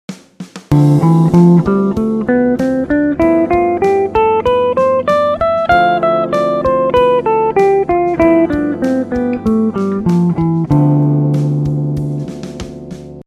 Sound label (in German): D Dorisch auf ab mit Playback 8 tel